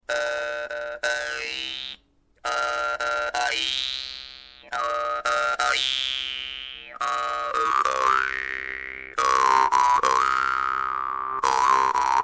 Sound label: doromb; chancelor nova; vargan